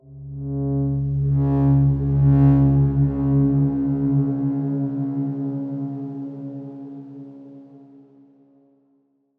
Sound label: Darkswarm C#2 ff; HSS Ableton Pack1